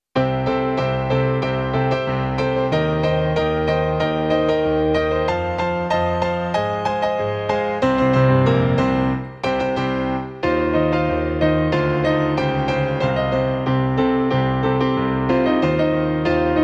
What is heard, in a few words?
Piano